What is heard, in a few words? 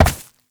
Step Vegetation; Warframe; Uriel; Movement; Steps